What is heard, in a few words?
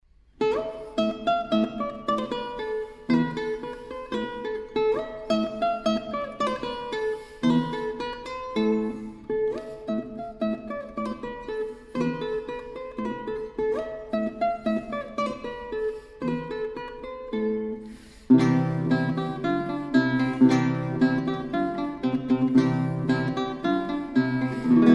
guitar; Allegreto In A Minor Ms91; Paganini